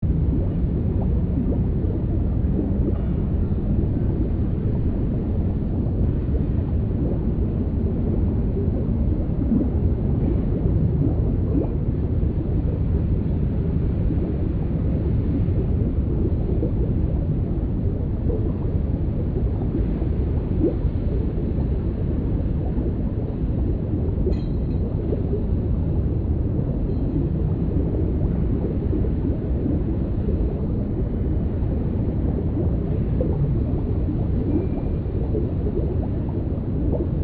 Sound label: Submarine traveling underwater perspective; vehicle